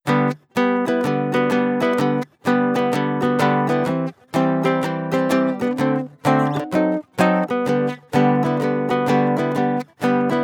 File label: baritone ukulele strumming pickup sequence; loops